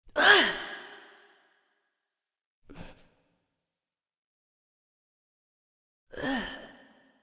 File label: Spell Vocalizations Female; Envoy; Cinematics; Bromius; Bromius Quest Verminia Spell